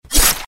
click; zhengjing

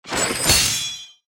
link clear

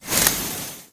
ice damage; FreeKill

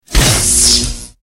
damage thunder; hujia

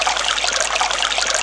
throw wine

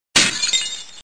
damage ice

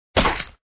damage

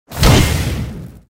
damage fire

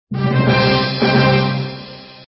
win

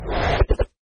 crossbow; FreeKill; standard cards

